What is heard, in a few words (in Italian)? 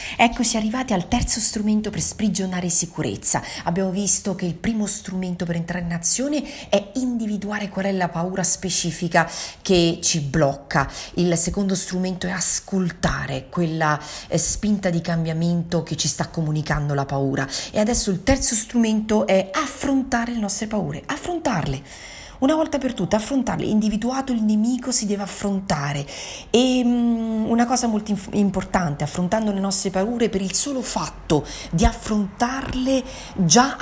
strumento